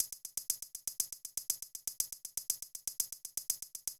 crunchy 125

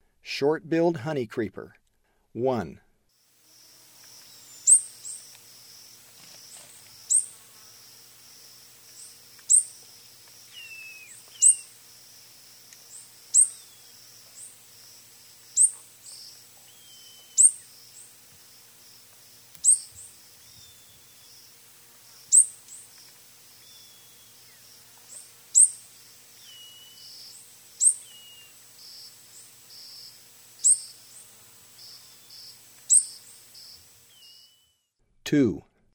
Short-billed Honeycreeper; S. America; Eastern Lowlands

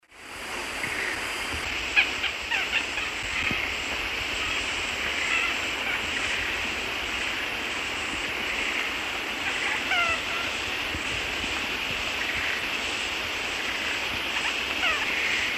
Automolus melanopezus, call; S. America; Eastern Lowlands

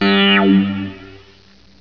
MOOG

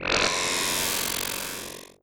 Laser Zap; Dance; SFX One Shots

